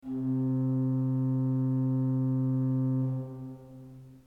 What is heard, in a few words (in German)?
ton, orgel